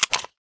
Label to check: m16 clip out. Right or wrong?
Right